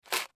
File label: Box Set Down Candy Mints Package; Foley; Blastwave